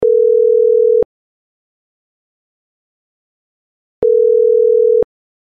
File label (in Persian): zang, بوق تلفن; انواع بوق تلفن و معنای آن